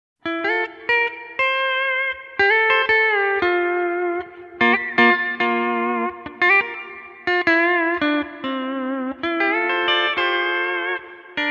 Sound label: Bass, AXE FX 3